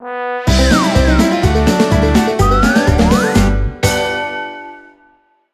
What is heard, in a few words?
Sting Happy